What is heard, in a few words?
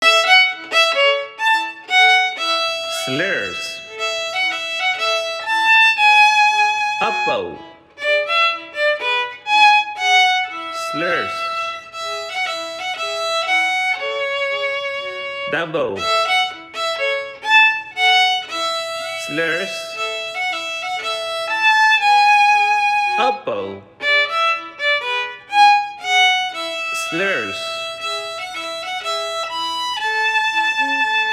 melody slurs, violin